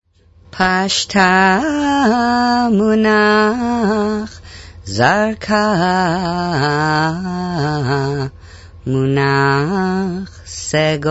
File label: chants Lithuanian Torah Trope